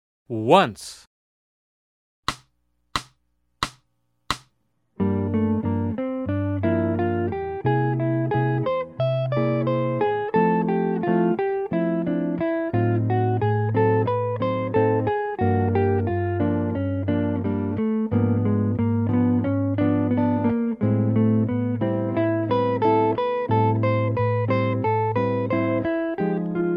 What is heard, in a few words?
Jazz